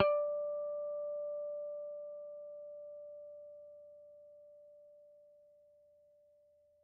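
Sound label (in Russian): clean harmonics electric guitar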